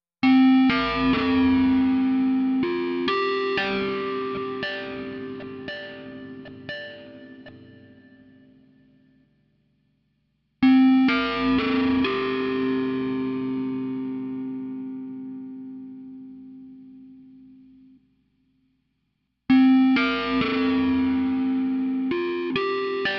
Melody

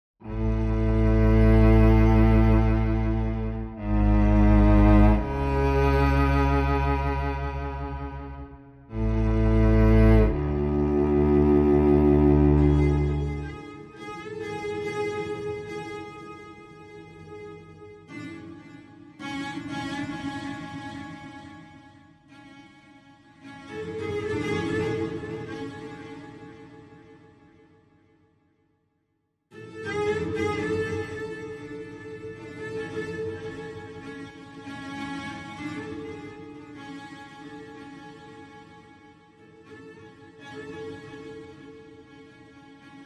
Cello

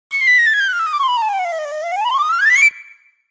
Piccolo Run Down Then UP

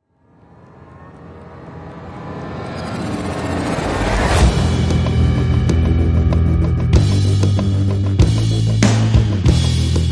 No Guitars

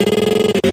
Droid; Short Reflex

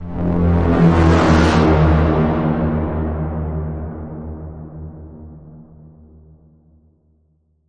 Bend, F

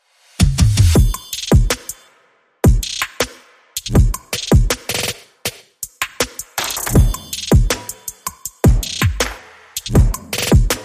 Drums Only

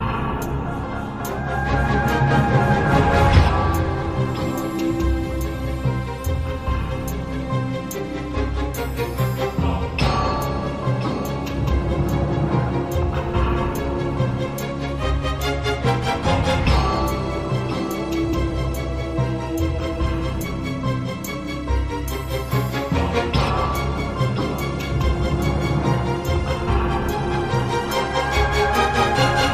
Tension

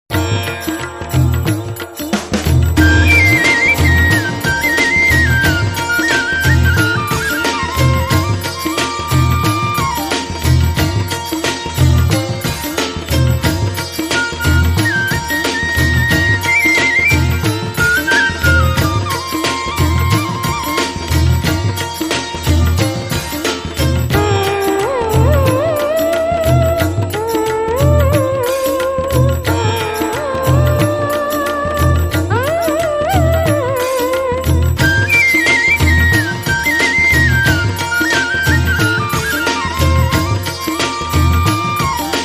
Hindi Beats; 60sec

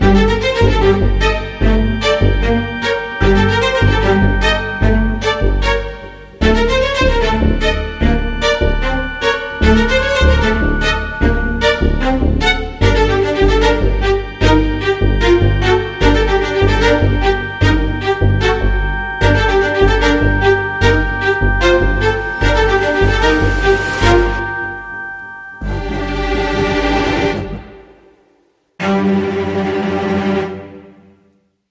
Emotional Dissonances, Strings Only

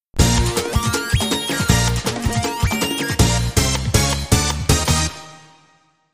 Hit Hit Hit, Jingle

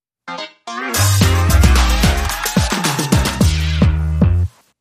Drop The Beat, Sting

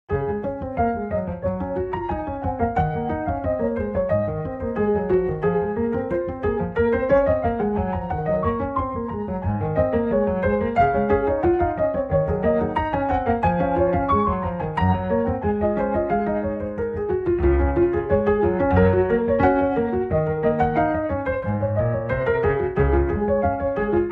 Bed

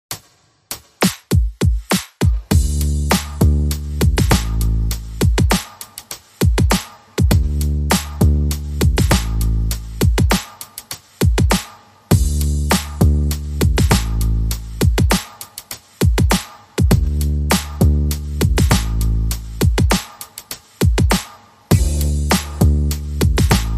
Drums and Bass

